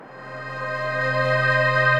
Optimus Rhyme Pad, Ethereal Pads; Ableton; Mood Reel

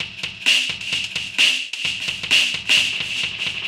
HipHop Beat 90bpm, MIDI Drum; Ableton; Factory Packs

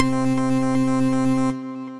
Synth Keys; Exciter Modlead

